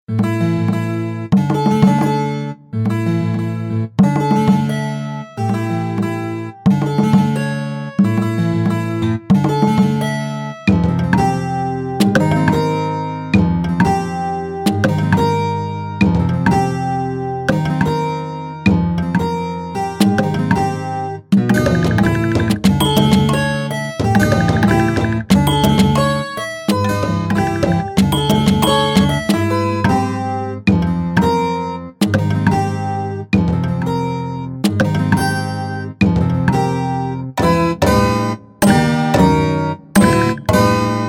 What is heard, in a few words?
bending the rules in bohlen pierce; MOTU